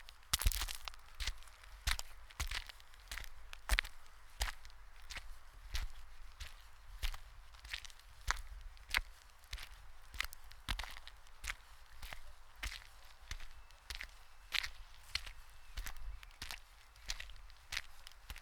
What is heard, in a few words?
Steps On Gravel